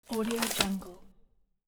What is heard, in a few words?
paper flip